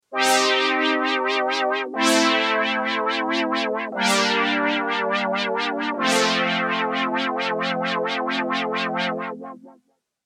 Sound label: Juno6; Number5